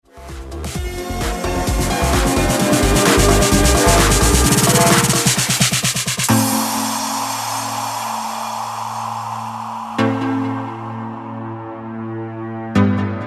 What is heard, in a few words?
dance music